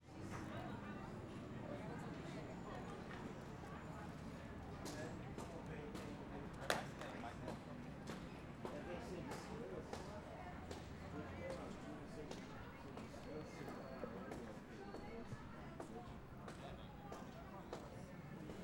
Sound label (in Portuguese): Ambiente Feira de manhã poucas pessoas vozerio alguns barulhos; Torre de Tv; Cerrado; canais stereo